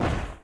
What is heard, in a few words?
death; FlyForInsanity